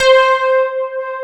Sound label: pad piano; East West - Global Sounds; S.I KEYS